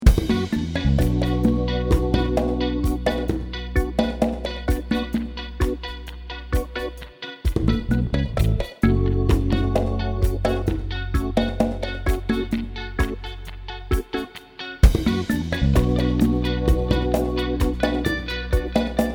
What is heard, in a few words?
reggae